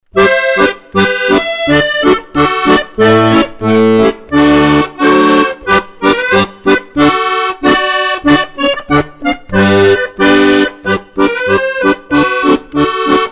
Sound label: Kruiz polka